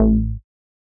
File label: character lvl down; sweetcandymania